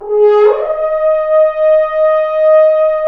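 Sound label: french horns, swells; Roland L-CD702; F.Horns FX+; FHns Swoops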